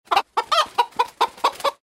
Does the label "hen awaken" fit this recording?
Yes